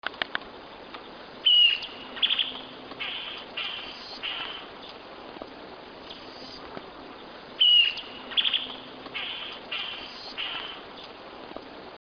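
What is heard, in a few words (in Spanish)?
Boyero Negro, Cacicus solitarius, Solitary Cacique; cantos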